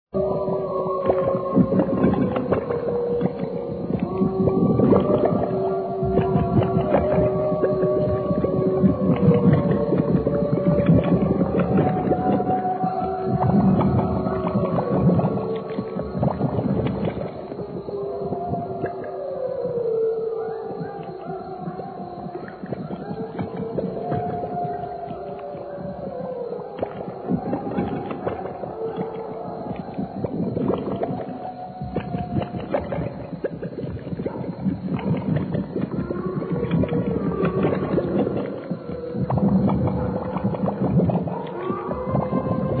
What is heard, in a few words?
Bubbles